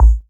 strong bottom end kick a key, bass drum single hit tuned to the A note; kick drum samples